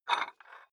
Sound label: dumbbell turn nut out, fitnessstudio